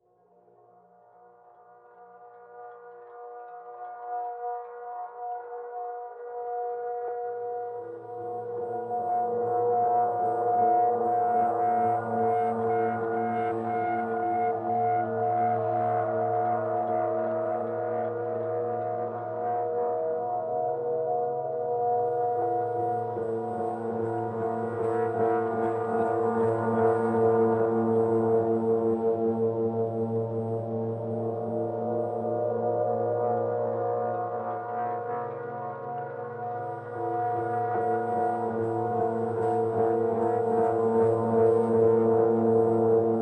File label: ambient animal; srosh